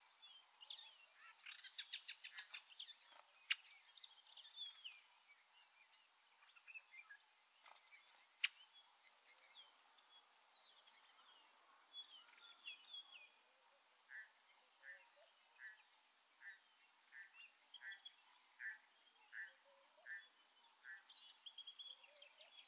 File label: ambience birds medium; Rust